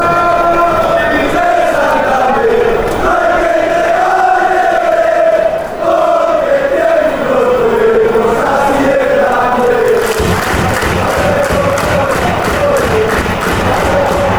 ole, fanchants

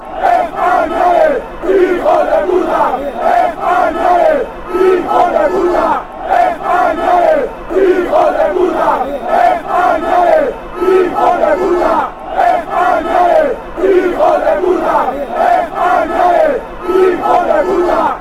chants; sons

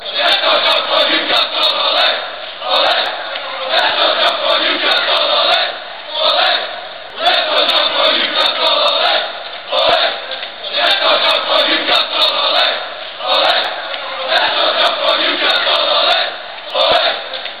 jump, chants; newcastle; australia

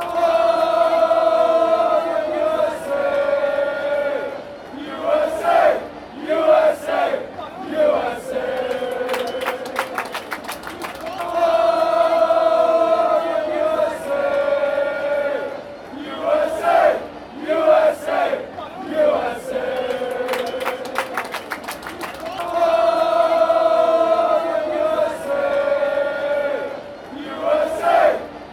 ooooh usa, fanchants

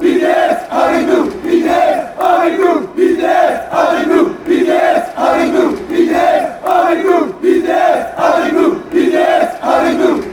with us, fan chants

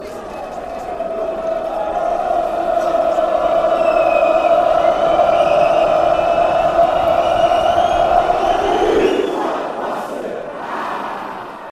goal kick, chants; bcfc

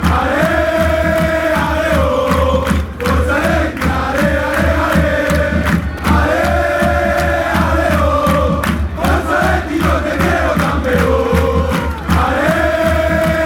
ale aleo, fanchants

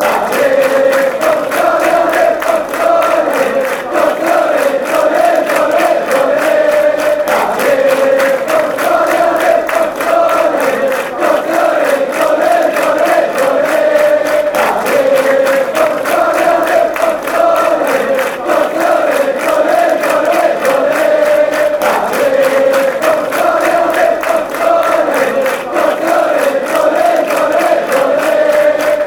ale, fan chants

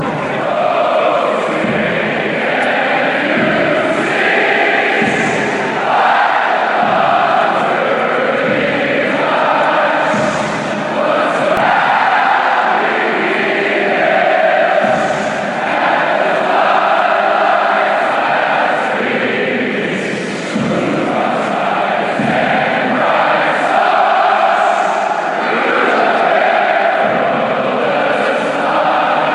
anthem, chants; star spangled banner

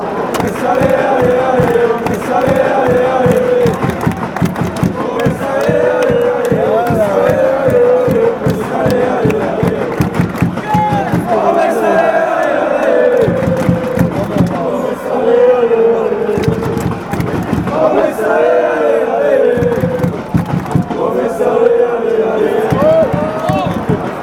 oh allez, chants; metz

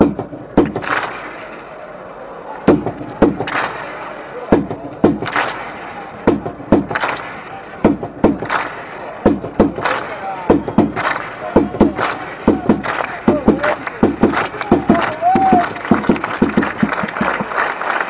claps crescendo, football chants; braga

